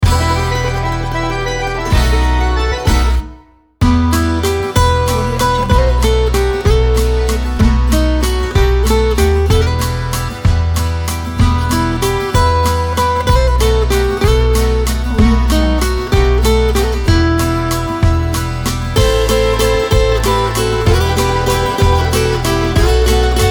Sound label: italian vacation upbeat happy